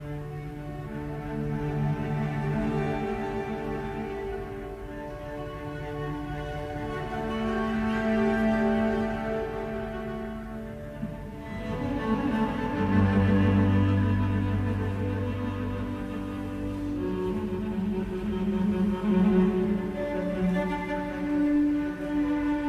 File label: Cello Suite No. 6 in D; Bach, J.S. - Gregson, Peter; Recomposed- Sarabande